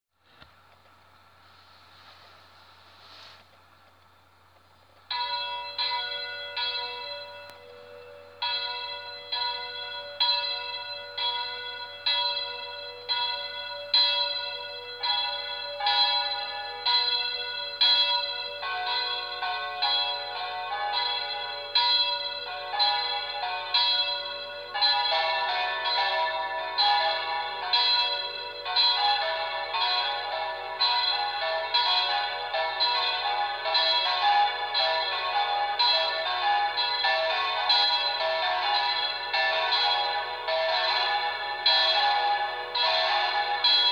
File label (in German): Glockengelaeut